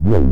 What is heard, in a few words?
303 Acid C; Vengeance Samples